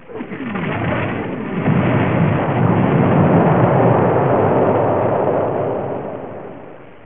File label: war amb